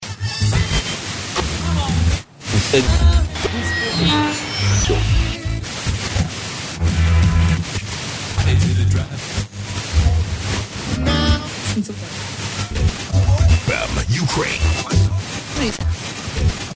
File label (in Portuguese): vinhetas e efeitos sonoros sintonia de radio, sintonizando radio